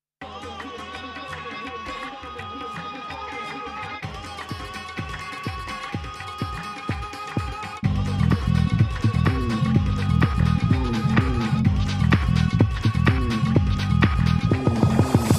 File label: House Tekno